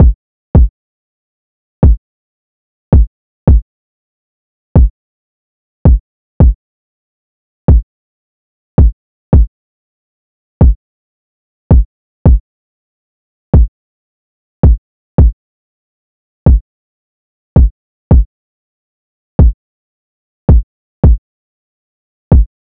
Knock Drum Loop 82 BPM Kick; Drum Loops; Loop Stems; Hallway; Cymatics; Mystery Pack Vol 4

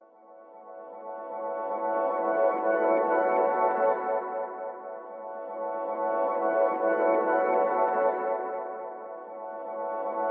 TEXTURE Crystal - 115 BPM D Min; Ear Candy; Cymatics; GHOST (Beta Pack)

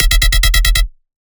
Bass Shot E Anger, Dubstep; Ghosthack; Advent Calendar 2018

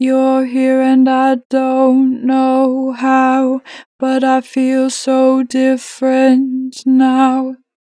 Natural Vibrato, Vocals, Different now, 4 bar vib; Harmor Vocals

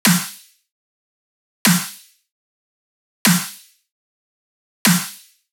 Dubstep Drum Loop Snare, 150bpm; Stem Seperated

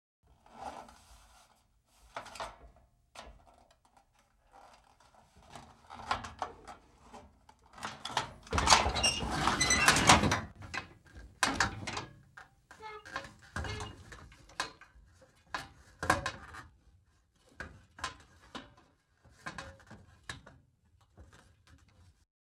Metal Grate Squeak Open; Fairlight Sound Lib

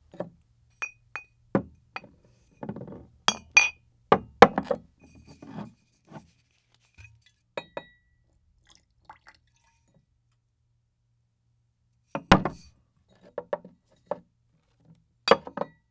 Bartender Action; Fairlight Sound Lib

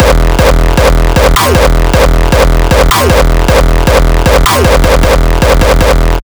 kicks loop 155; GhostHack

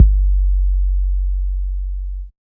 Bass, Dubstep 808 Basses; GhostHack

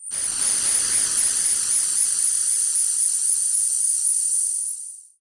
increasing noise

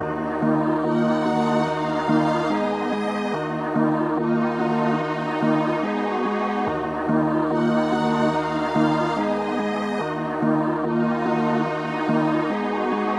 144 BPM D# Min, Melody, Drill; Cymatics; 2022 Melody Collection

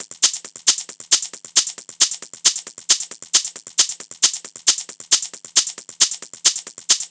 Hat Loop 135, Techno

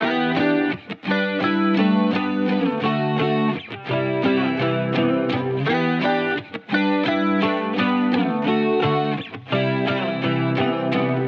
170 BPM G Maj, Guitar; Best Days; Cymatics; Miami Guitar Pack - Pt 1

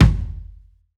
Kick Acoustic, Beater; Drums; One Shots; Ghosthack; Drum Mega Bundle; Drum Hero 5

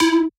Techno Synth Shot E; Synth One-Shots; Ghosthack; 45 Free Techno Synth Shots 2025

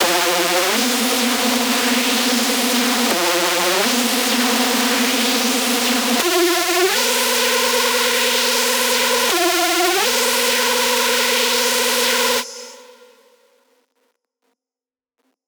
screech loop F min 155; Music Loops; GhostHack

